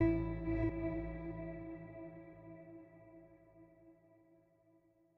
Synth start; miside demo windows gamerip 2023

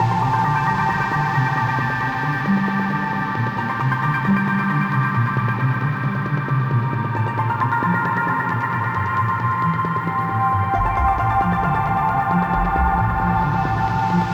Music Virtual Menu; sayonara wild hearts 2019 gamerip

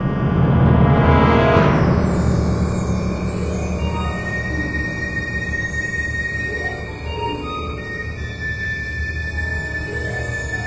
Eerie; Back to the Future: The Game; Episode 1

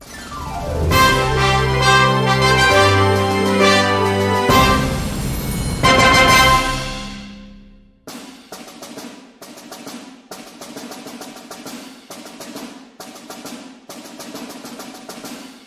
Big Boss Clear; Yoshi's Woolly World

